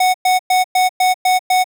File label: alarm clock